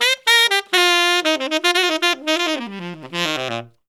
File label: SAX SOLO; Zero-G; Phantom Horns